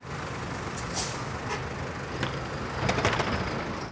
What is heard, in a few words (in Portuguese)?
Porta de Onibus se Fechando em Ponto da W3; portas; Coleção Sonora do Cerrado